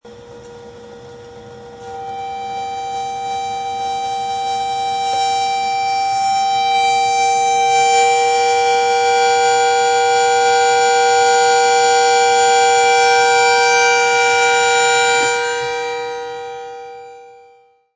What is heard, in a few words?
Kettle Whistle, Tea kettle starts to steam and then whistles shrilly; Kitchen; Household